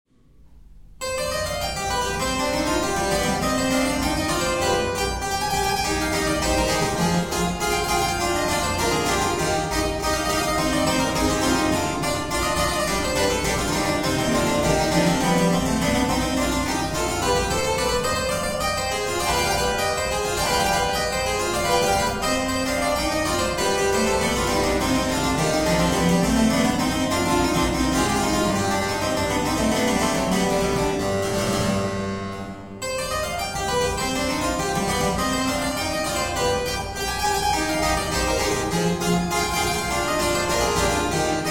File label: Keyboard Sonata in D Minor (Allegro)